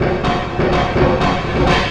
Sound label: DRM, BACKGROUNDLP; Best Service ProSamples vol.49 - FX-Area; AKAI